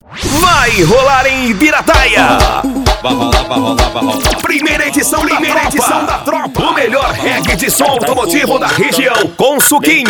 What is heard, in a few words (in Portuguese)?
vinheta reggae